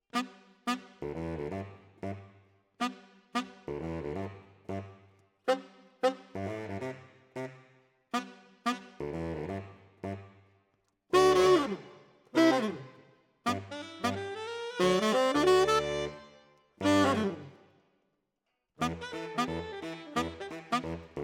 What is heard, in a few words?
Tenor, RNR for piano